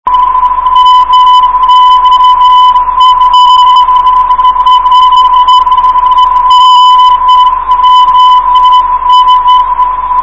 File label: mos 280, ndb